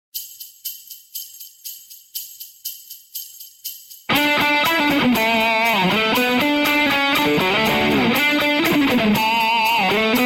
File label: Christmas Rock